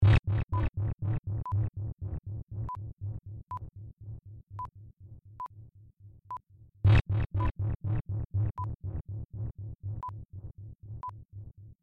Bass Zapper

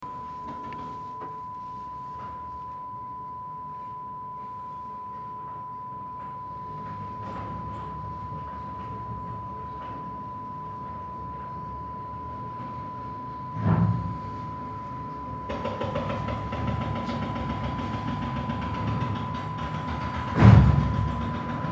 Roadworks

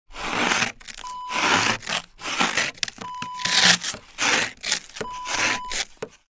scraping ice

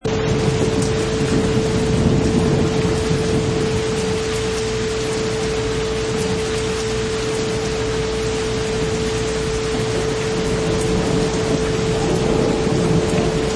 Thunder Storm

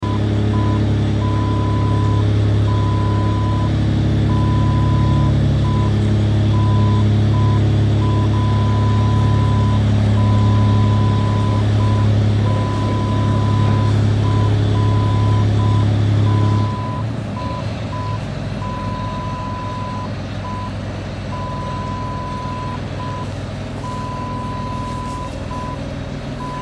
Canal Boat Tunnel

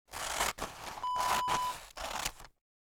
scissors cutting

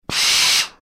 Iron Steam

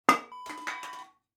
beer can drop

